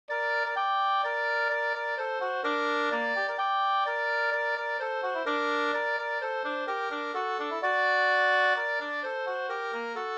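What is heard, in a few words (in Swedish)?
polska, tvåstämmig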